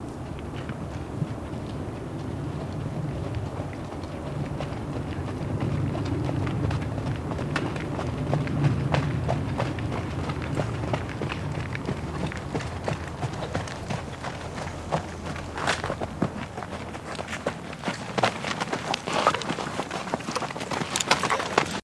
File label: Horse Canter In Stp